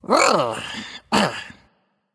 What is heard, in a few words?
nv grunt lift; cupid